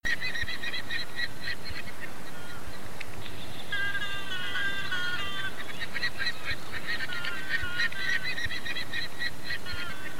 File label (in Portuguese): canto do trombeteiro